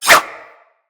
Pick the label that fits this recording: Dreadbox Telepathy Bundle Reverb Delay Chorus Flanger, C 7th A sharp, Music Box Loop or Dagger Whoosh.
Dagger Whoosh